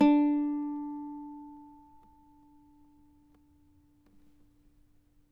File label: CAVAQUINHO, Guitars; Spectrasonics; Hans Zimmer Guitars VOL-2